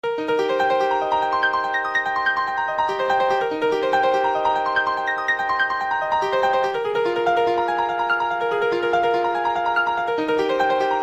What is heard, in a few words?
PIANO FAST